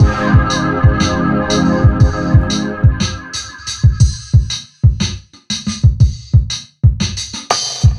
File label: Shoe Unlock Beat Loop; Anger Foot